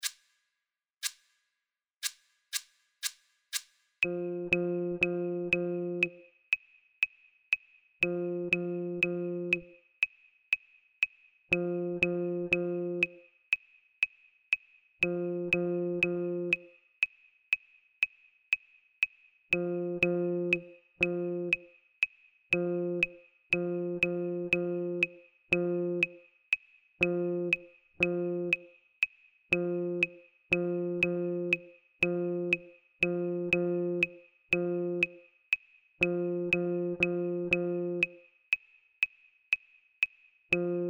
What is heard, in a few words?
Quarters 120 bpm; rhythm reading